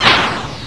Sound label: MISSILE